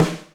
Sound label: Snare; Drumdrops Recording Hybrid Kit; Drum Hits